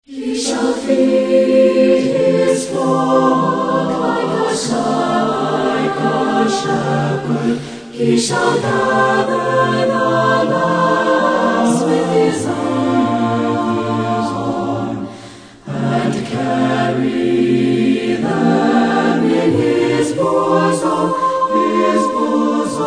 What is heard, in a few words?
Singers With Orchestra